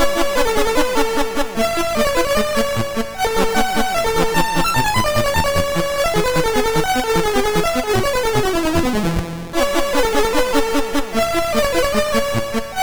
Sonatina sid MOS6581R3

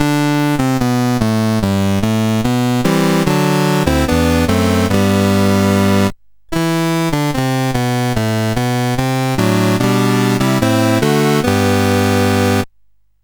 Summer Games, CSG8580R5

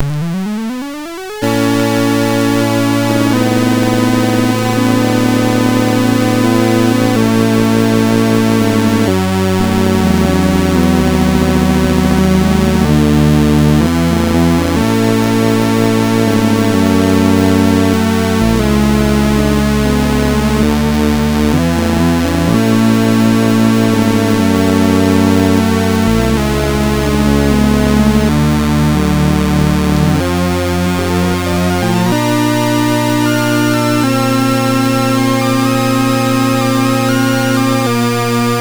Shackled, MOS6581R2

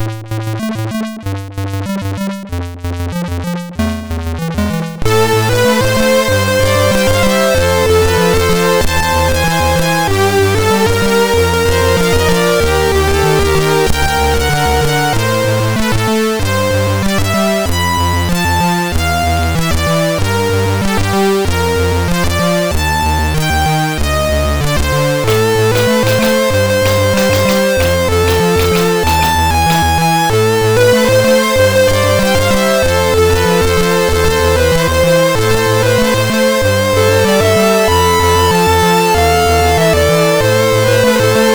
sid MOS6581R3; Illusion

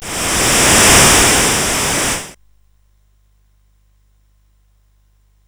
sid CSG8580R5; Jumpman Junior

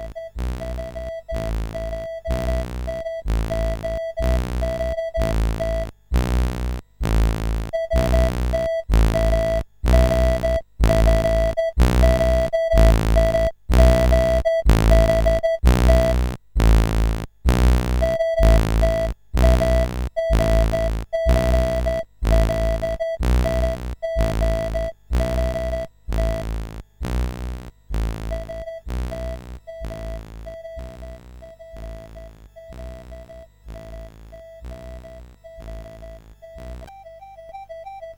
Starflight, CSG8580R5

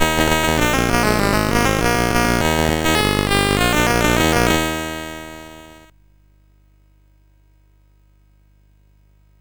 Strategic Defence Initiative, CSG8580R5

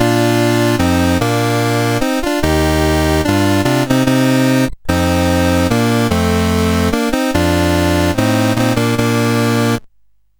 Summer Games, CSG8580R5

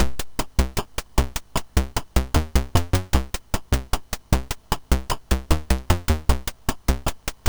Security Alert, MOS6581R3

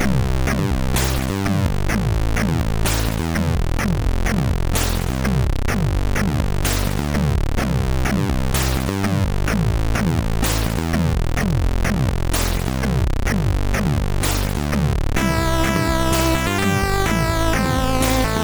tune, sid MOS6581R3

